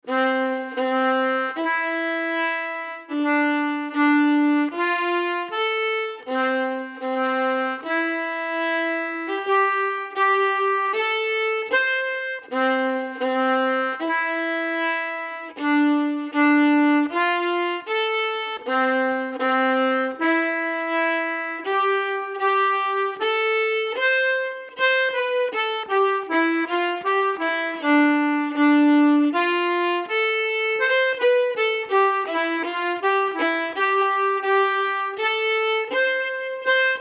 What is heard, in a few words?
Softly Robin Slow